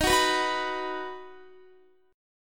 D sharp Minor Sharp 5th D sharp down Guitar; strummed

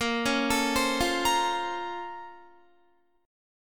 Bb Minor Major 9th, Guitar; BbmM9; arpeggiated; 18,16,19,17,17,17; JGuitar

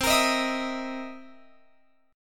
C 7th Sharp 9th C Guitar Open F; C7#9 strummed; x,19,22,22,19,22

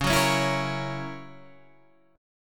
F sharp 7th, guitar

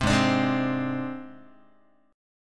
Ab Major Flat 5th Ab Guitar; AbMb5 strummed; JGuitar

